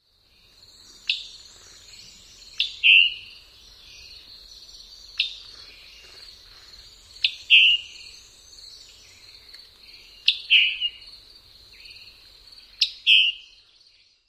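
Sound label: chick and chick-bree; KY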